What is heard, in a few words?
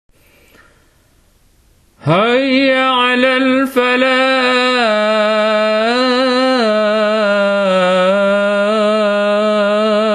Adzan